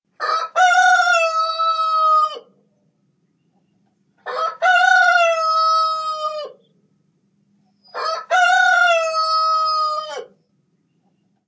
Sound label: cock a doodle doo; Google; Google Sounds; Reality Bytes